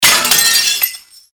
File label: crash and glass break